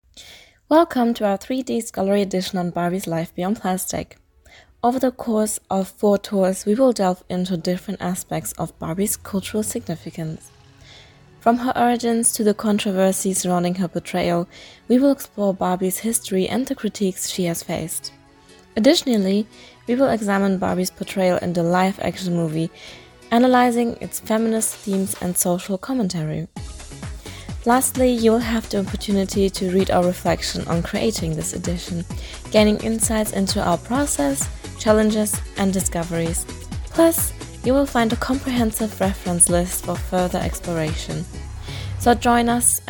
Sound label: karaoke instrumental